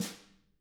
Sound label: HIT, Drums; CAMCO